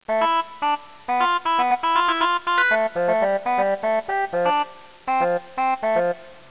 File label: bassoon